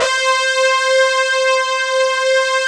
fat, synth pad

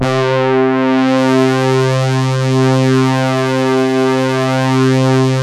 JV GR LEAD, JV-1080; Syntec; Wall of Sounds

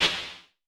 CLAP, Vermona Mono15 Drums; blend with snare; Stereo layers

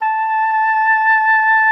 A5, OB D.AMORE; Xsample VOL-7

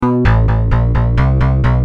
Bass, Industrial

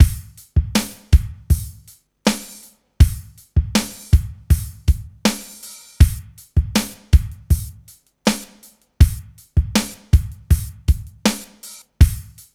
ROCK; INIS; Funky Xtreams

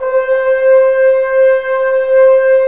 BRS Tbns; Roland - LA Composer vol. 4

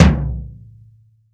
BD, REAL DRUMS, JUNGLE KIT; Best Service ProSamples vol.43 - Real Drum Kits

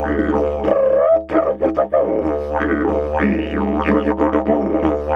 Didge 93 BPM, Didgeridoo

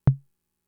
Tom, Fricke MFB-522

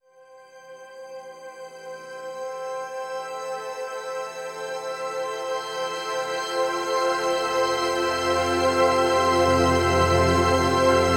Hi Drift Bed, Spektral Minimalism; Sonic Foundry (Sony Creative Software); James Johnson; Complete Beds

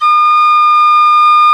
Penny Whistle; Winds; E-MU Producer Series Vol. 7 – Old World Instruments

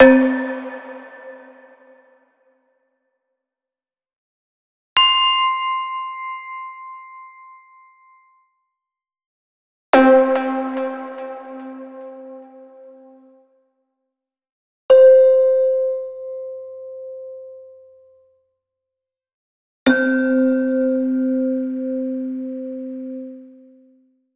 Plucks; monosounds

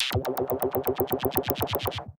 C2, SynthLine; 90 s Sample CDs; NiceBeats; SynthLine VOL-14

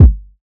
Kick Body

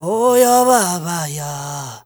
Zulu male, Heart of Africa, male dry; Spectrasonics; Heart of Africa 2; S.MALEDRY

